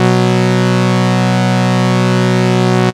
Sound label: Raw Synth 5th; instrumente